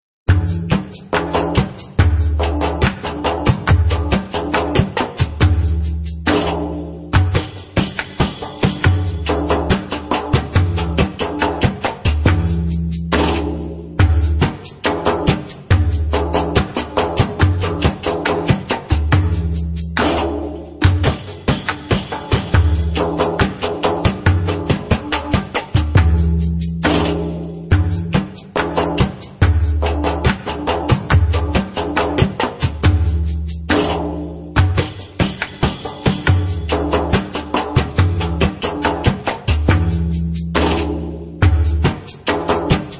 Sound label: Suppaa Maqsum Long (slow)